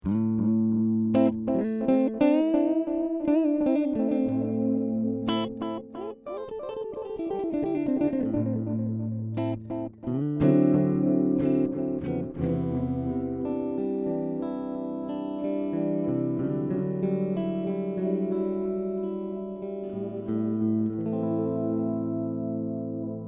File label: Delay, guitar